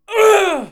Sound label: Grunt